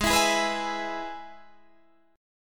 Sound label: G sharp Minor Sharp 5th, Guitar, Half Step Down